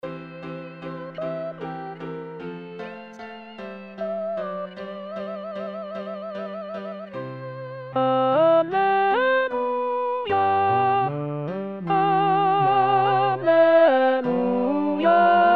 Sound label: Regina Coeli K108 ALTO; Mozart; 2. Tempo moderato; soprano alto tenor bass